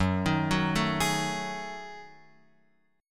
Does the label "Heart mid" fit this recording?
No